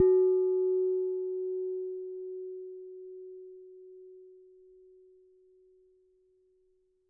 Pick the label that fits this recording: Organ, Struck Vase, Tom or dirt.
Struck Vase